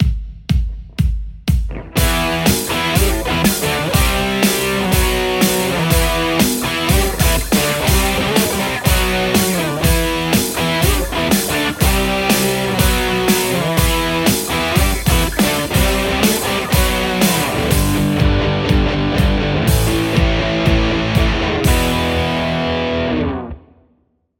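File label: Telecaster; tse x50 v1 02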